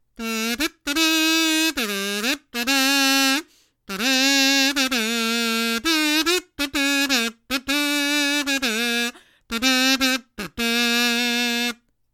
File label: kazoo